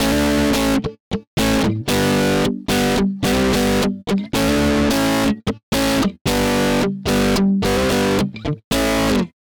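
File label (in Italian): amp no, Esempio di suono senza simulatore, chitarra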